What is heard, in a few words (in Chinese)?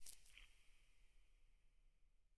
眼眸花闭合声